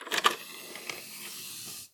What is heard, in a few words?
eject disk tray